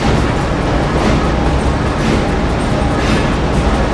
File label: Machine Dynamo Loop; Machinery - Generators